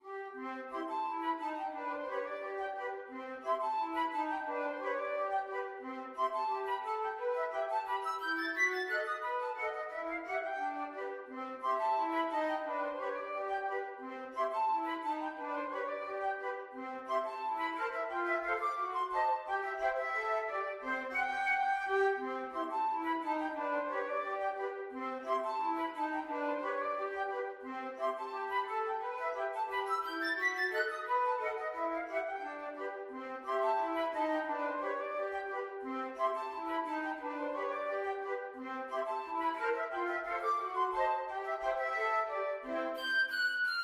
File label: frog legs rag, flute trio